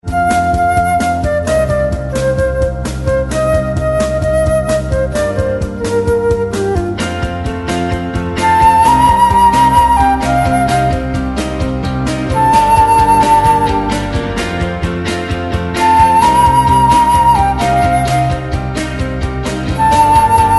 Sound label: clocks